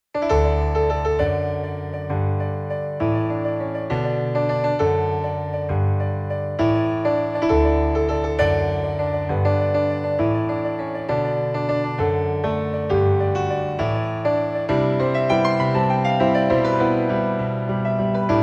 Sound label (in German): Telefonjingle; corporate sound; Mannheimer Morgen